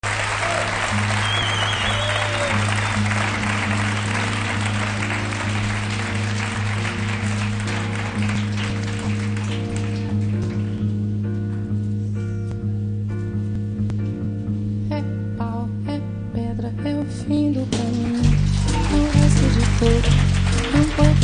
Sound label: montreux jazz festival